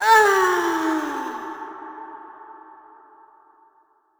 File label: death; Crypt of the NecroDancer